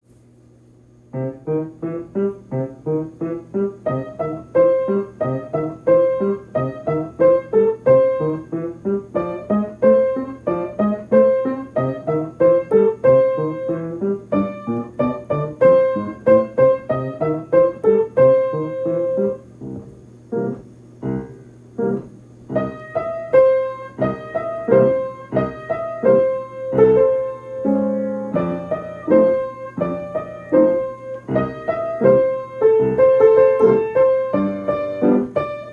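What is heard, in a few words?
boogie, Piano; Popcorn Boogie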